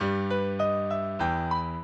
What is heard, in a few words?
minuet, mozart